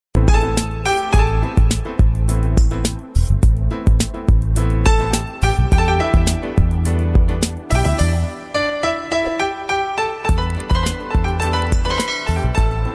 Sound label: instrumental ringtone old is gold instrumental ringtone